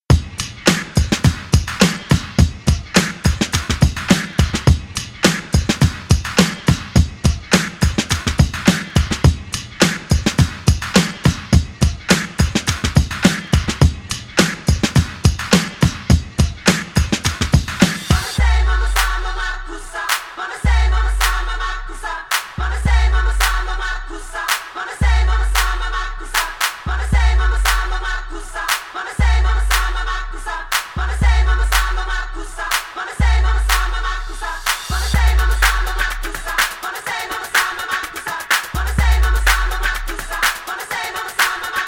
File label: transition beats 105 125